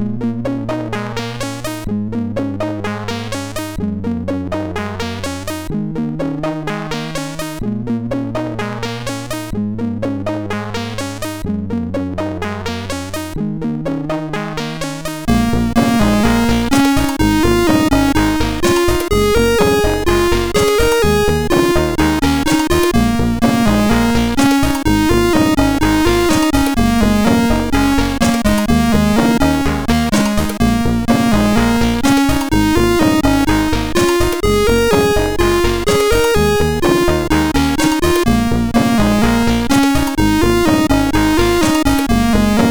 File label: sid MOS6581R4